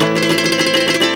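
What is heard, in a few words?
OCT GUIT MEL, OCT GUIT MELODY; Best Service; World Colours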